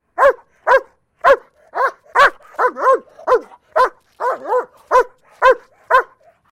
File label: German Shepherd Barks; Birds And Animals